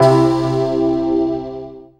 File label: dance chord